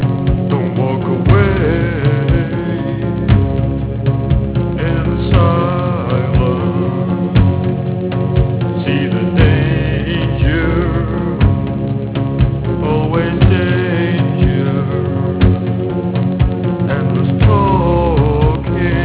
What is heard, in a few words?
atmosphere